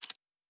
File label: Metal Tools